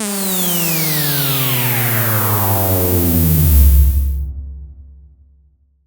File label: Zap, PsyTrance